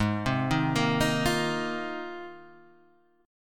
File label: Ab 6th Add 9th Ab, Guitar, Half Step Down